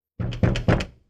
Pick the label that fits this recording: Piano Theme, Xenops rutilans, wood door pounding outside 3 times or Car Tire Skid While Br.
wood door pounding outside 3 times